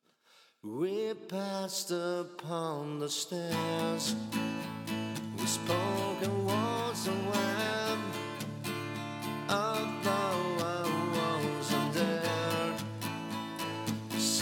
Gtr Vox

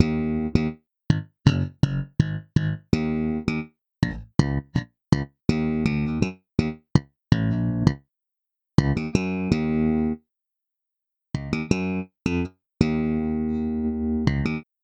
Bass Wet

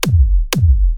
Zap Attack; EDM; Dropkick preset

